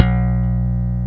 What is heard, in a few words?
SLAP E3, BASS; East West; Global Sounds